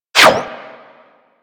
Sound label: Bullet Parry Metal; Warframe; Bullet Parries